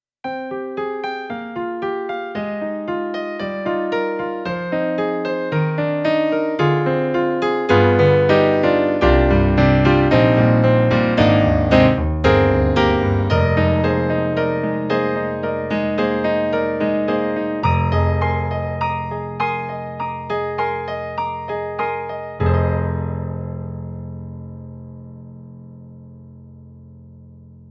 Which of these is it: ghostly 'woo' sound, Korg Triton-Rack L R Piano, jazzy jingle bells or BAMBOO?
Korg Triton-Rack L R Piano